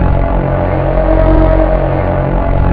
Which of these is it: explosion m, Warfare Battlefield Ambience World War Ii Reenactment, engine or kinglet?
engine